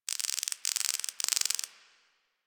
Night Insect Click; Ambience; One Offs; Insects; Warframe; Quests; The New War; Drifter Camp

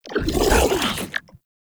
Infested Shotgun Idle; Weapons

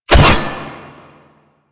Machete Whoosh

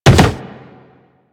Land Soft Wood, Footsteps; Warframe; Operator

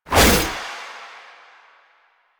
Naginata Whoosh; Weapons; Melee; Warframe; Koumei Naginata

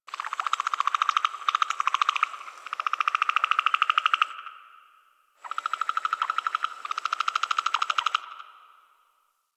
Night Tree Critters; Warframe; Ambience; Eidolon Plains; One Offs

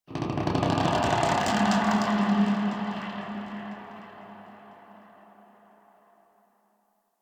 Space Rings Details; Ambience; Exterior; Warframe; Railjack

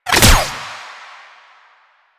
Gunbrella Bullet Deflect, Weapons; Warframe; Tenno; Koumei

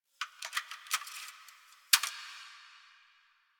Computer Room Floppy Disc Misc; Ambience; Warframe; Nineteen Ninety Nine

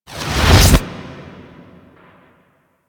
spawn landing lead in; Enemies; Warframe; Kela De Thaym

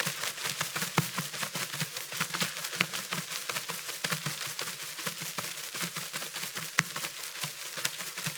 Sparrow Idle Loop Wing Flaps; Animals

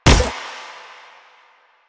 Mech; Duviri; Incarnon Adapters; Incarnon Sicarus Prime; Weapons

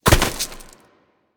Bird Of Prey Bodyfall Dirt; Warframe; Hunting Critters

